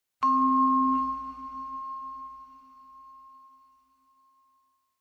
Vibraphone Door Bell; mallets

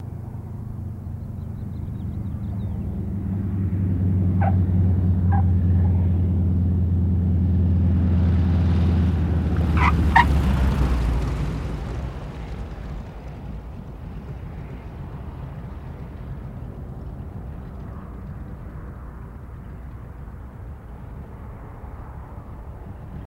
Bi Plane Land Light; land & takeoff

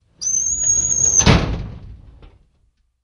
Drawer Metal Close

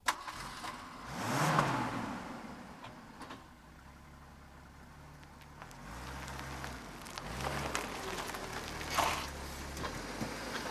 Car Start Medium

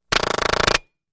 Silenced Machine Gun

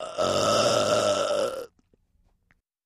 Lrg Forced Belch, belching

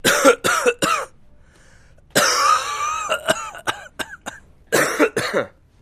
Coughs Various

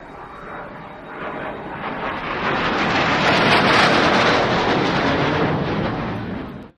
C Harrier Fly By Left, AV-8B Harrier

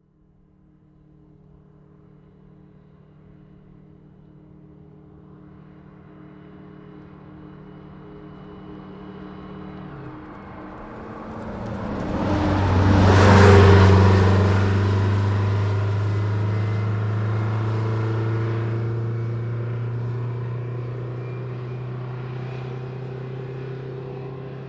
Bus By Gear Shift

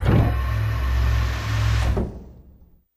Elevator Main Door, elevators

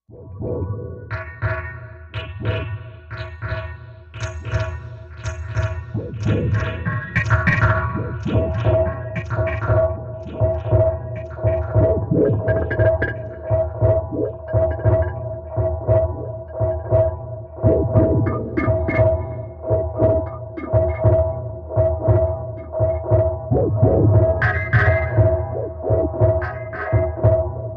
Robot Dogs Machine Met, sci-fi ambience

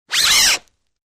Rope Zuzz Slide

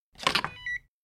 Swipe Key Card Alarm; hi-tech-various

